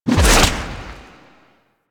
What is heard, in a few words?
Melee Heavy Attack Whip Whoosh; Warframe; Weapons; Whooshes; Heavy Attacks